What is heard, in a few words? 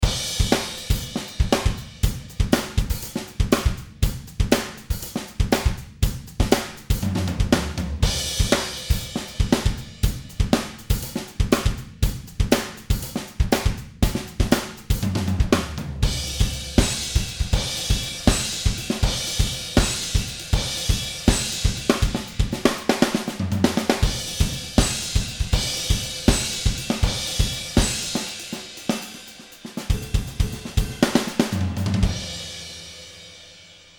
pop rock